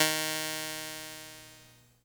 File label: VXHRPCD1 EB2, VOX CONTINTL, Vintage Keyboards; Big Fish Audio; First Call Vintage Keyboards